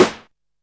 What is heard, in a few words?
solid acoustic snare sample f sharp key